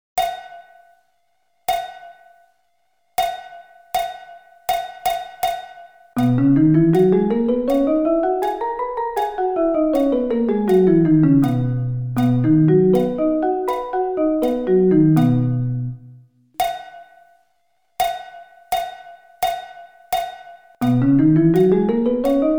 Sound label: B Scale